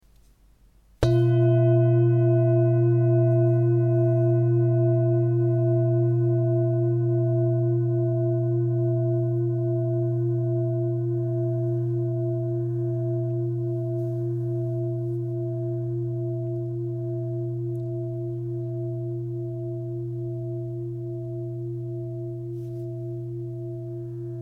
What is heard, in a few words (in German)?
Klangschale